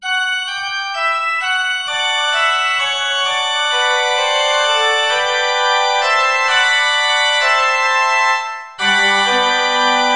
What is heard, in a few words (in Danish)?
forspil